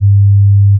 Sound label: pure tone